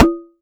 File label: Perc; EFM